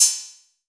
TEK E-CRASH, SYNTH CYM; Drums In Your FACE!

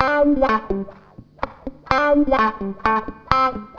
WAH, WAHWAHGTR; USB Soundscan vol.31; Miro s Grooves; AKAI

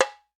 TAMBORIM, Latin Hand Perc; E-MU Producer Series Vol. 2; More Studio Essentials; Composer

